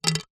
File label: office ruler wooden set down on table